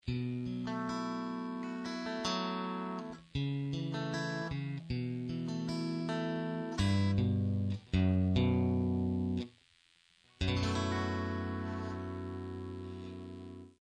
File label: blackface lux